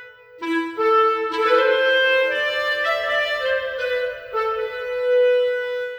Clarinet; сэмпл кларнета movie score

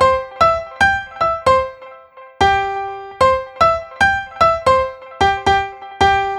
Single Notes, reggae; регги

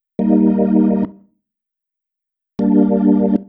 Organ Chord

